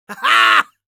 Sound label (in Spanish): laugh short; Demoman